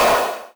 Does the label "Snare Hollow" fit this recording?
Yes